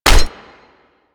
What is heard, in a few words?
Anti Aircraft Gun Low Clip Mech; Weapons; Warframe; Archwing